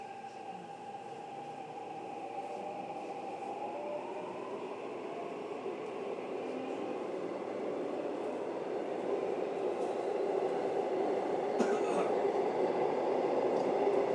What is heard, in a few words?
seoul subway ambient sounds